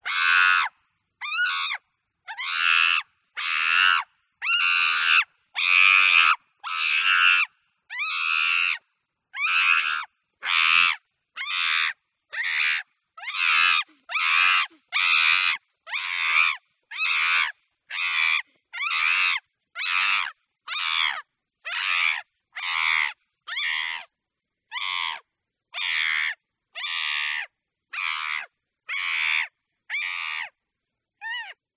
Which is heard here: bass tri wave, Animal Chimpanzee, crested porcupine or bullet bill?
Animal Chimpanzee